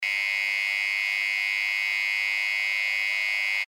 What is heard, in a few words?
current hum